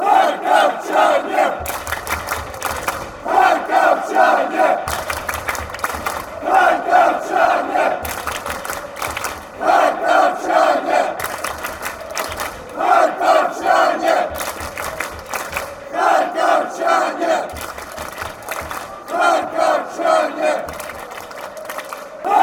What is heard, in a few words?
metalist chant, fanchants